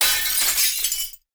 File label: glass break; teardown ps5 windows xbox series xs gamerip 2022